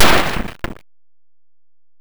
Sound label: hit wood; valve; hardhits